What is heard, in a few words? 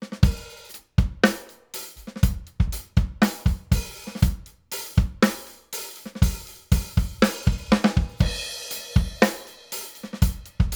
Rupert Neve Designs 5254 Drums